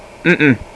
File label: uhh uhh; People; Sound Library 2000; Wayzata Technology